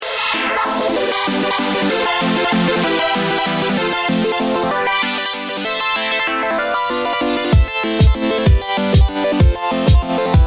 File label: Ultra Saw Trance